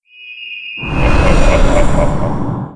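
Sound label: Mob raid skill; Monster; 레이드; 유명대제음구유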